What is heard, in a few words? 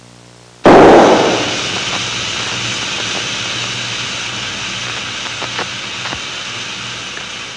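tire blow, transportation; Web Clip Empire 150,000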